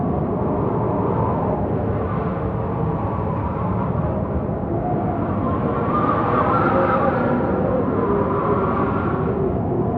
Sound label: wind